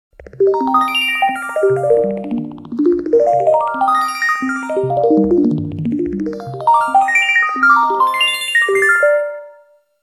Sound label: random tune ringtone